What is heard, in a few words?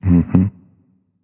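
non verbal thinking, voice; cernunnos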